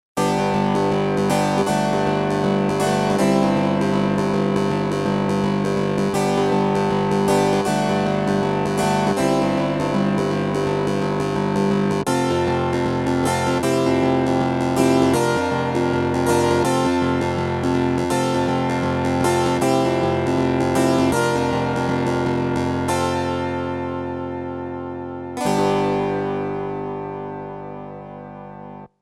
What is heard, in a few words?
Roland MKS-10 Planet-P clavi chorus